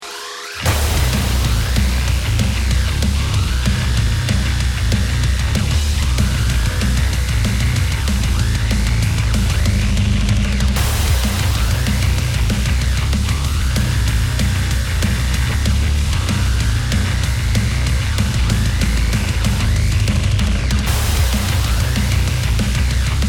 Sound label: bomb planted